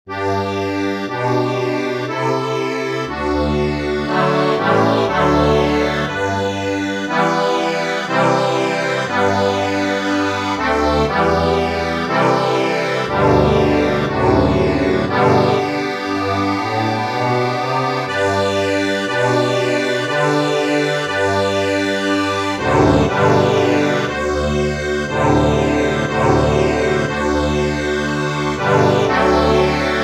accordion, sheet music

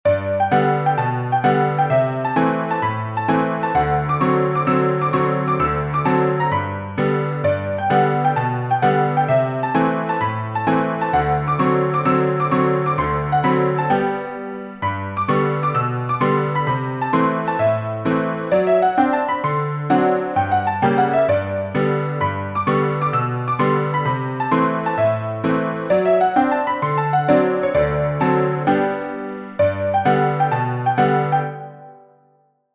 piano, sheet music

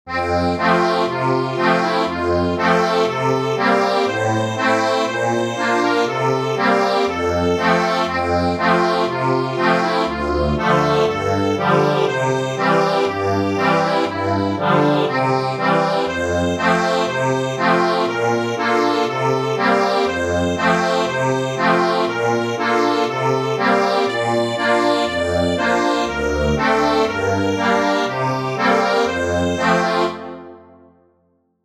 Hark the herald angels sing acc

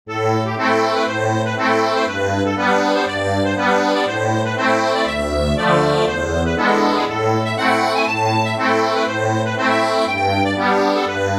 accordion

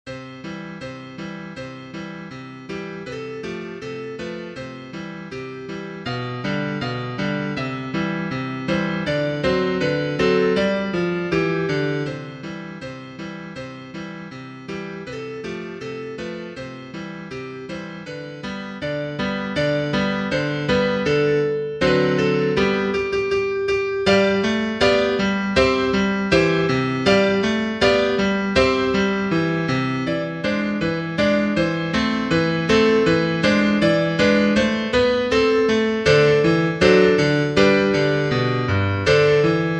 PIANO

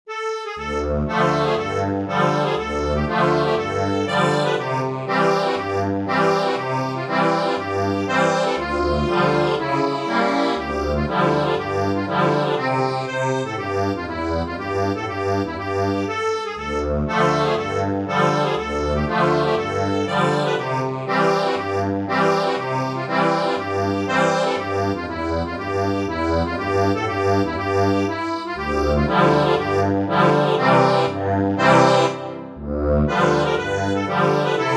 accordion, sheet music; Creepie crawlie

